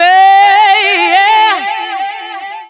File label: Hey Yeah; Philips; Multimedia Studio